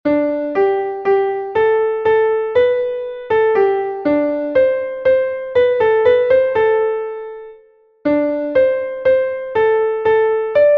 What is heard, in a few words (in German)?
klavier; MelodieMeister